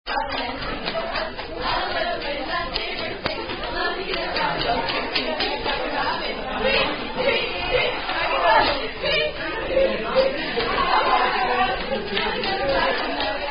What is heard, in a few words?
Hand Clapping Game